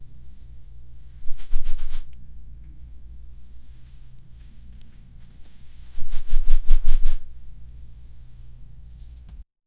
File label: dragon moving wings